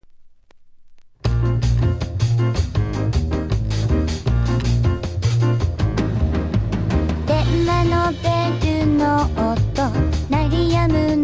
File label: pizzicato